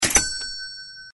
cash

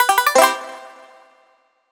Collect Honeycomb

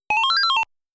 Credit Sound; Dig Dug

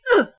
death Woman

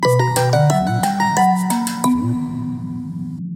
Creepy Collections

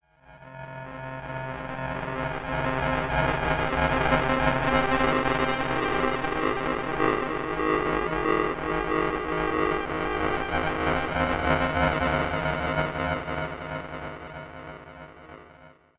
Success Tonal B6; Insanely Twisted Shadow Planet

